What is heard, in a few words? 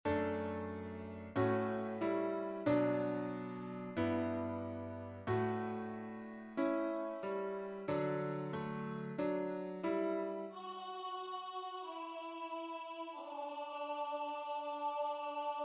SATB; lead me lord; wesley